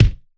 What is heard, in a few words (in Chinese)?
拍桌; 你的名字; mitsuha